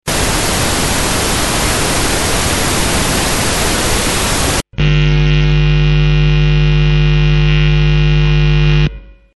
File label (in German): rauschbrumm